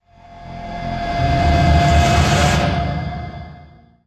Camera Transition

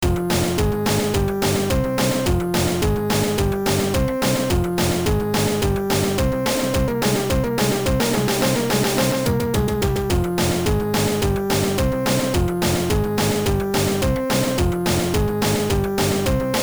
ice boss tune

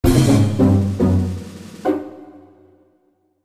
Jingle Defeat; Swords & Souls Online

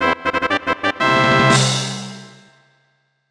Correct!

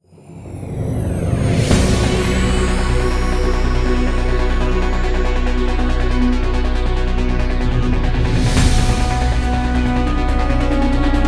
Combat; mass effect 2; Collectors' Base

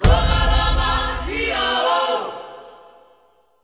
Result Mission Levelup Jingle; rakoo friends wii u 2015

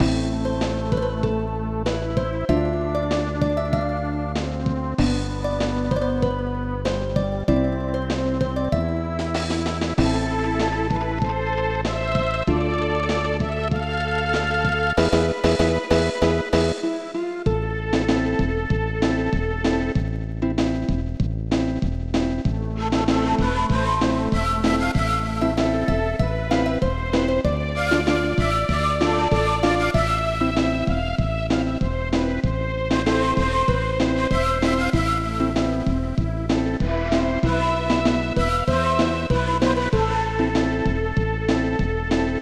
Karaoke; Zan 3 Spirits; snes

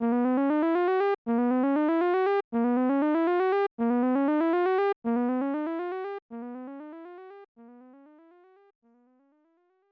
Intruder Alarm; Paladin's Quest; Lennus; SNES

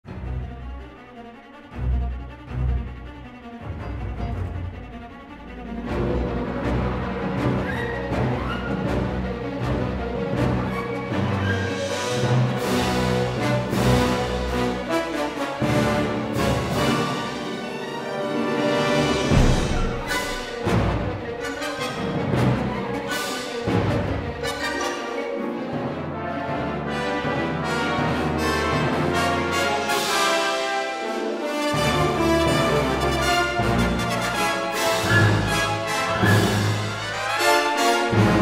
Combat Loop

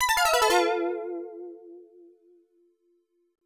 Miss; The Legend of Kage; arcade

